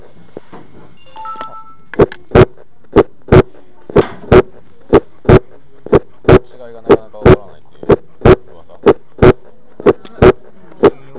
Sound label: Normal Heart Sounds (S1 S2)